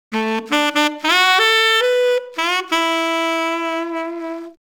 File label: sax